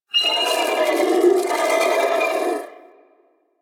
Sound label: Sentient Idle, Barks; Enemies; Symbilyst